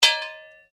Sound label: Blade Heavy Moving Impact Ring, Sword; Foley sounds for melee weapons; Combat